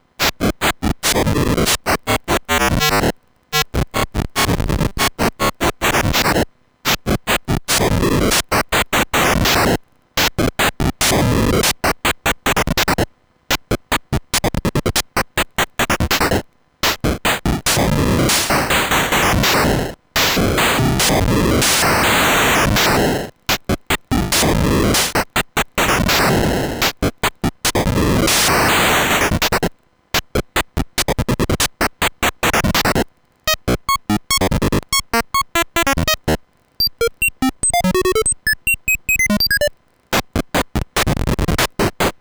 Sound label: env test